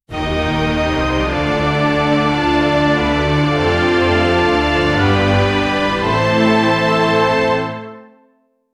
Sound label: epic orchestral score wit, japanese